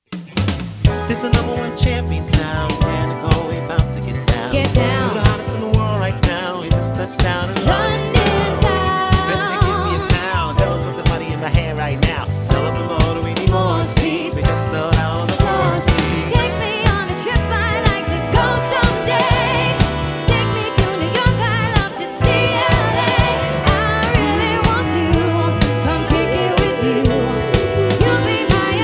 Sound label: Variety Band